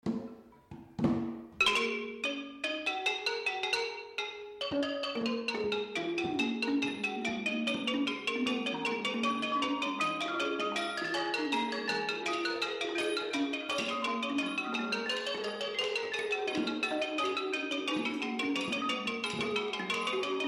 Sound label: Pi Phat ensemble